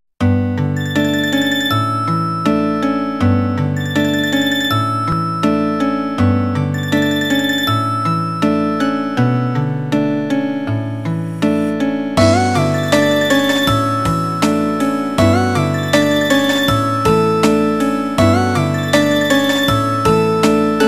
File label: nokia ringtone; nokia airy